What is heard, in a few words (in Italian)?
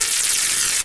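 Elettrico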